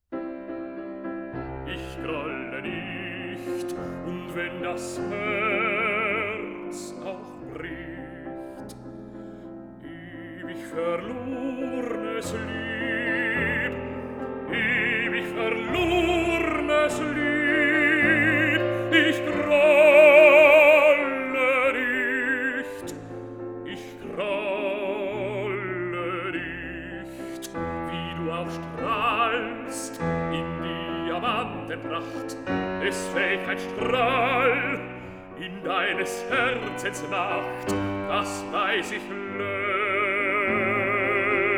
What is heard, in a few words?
song cycle for voice & piano; Dichterliebe; No. 7. Ich grolle nicht; Schumann